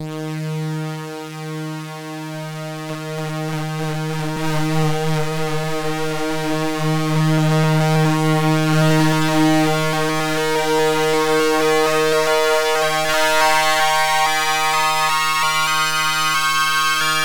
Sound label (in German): OB6 P6 Vergleich Sync Sweep OSC1